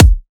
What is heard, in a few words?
Riddim Kick